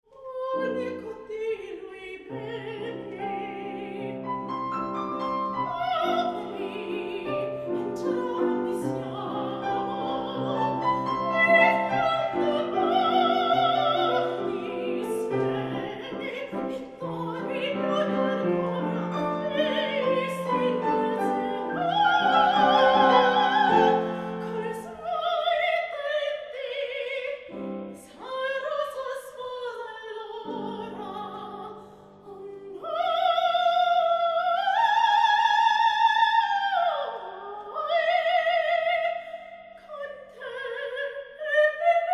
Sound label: O luce di quest anima, Sopran; Donizetti; Meisterkurs